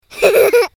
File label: giggle; cutiepugs